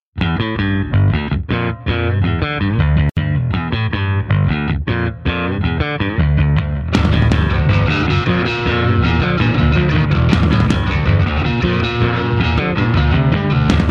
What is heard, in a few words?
bass drums